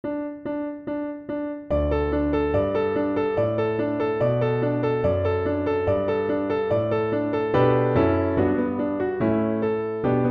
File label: Vocalise High Accompaniment